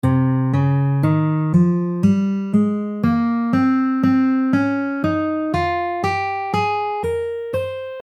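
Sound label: D Phrygian Modes, guitar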